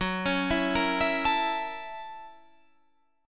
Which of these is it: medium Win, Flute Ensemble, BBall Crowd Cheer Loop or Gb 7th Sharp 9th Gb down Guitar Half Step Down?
Gb 7th Sharp 9th Gb down Guitar Half Step Down